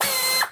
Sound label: dog servo; Source Engine; video game; npc